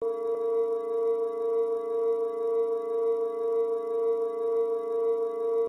gunstand beacon loop, turret; gow; turret judgement

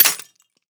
pick break, lockpicking

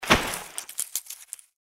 bodyfall; npc; cazadore

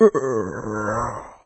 zombie die

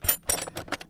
toolbox; snds jack gmod

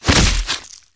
sword flesh

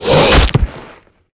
heavy hit

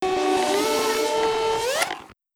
smelter display open

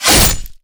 hit mana blade; balor

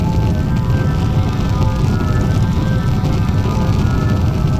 fireball

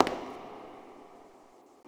succubus step; queen girl

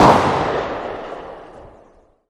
glock17 single dist

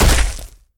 step run

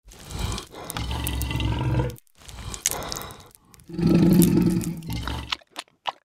deathclaw idle teeth pick; npc

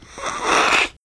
insufflation; drugs

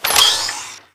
napalm cannon out, flamethrower; kf2; weapons; trapper

